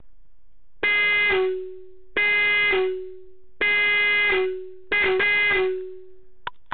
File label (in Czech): klakson 12v 400hz 110db diskovy (130mm)